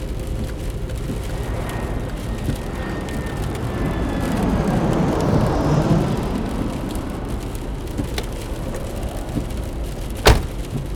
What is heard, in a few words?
drive airport